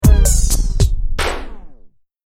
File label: Turntable Scratching Stop Brake; Dj; DJ (Sound Effects:Musical)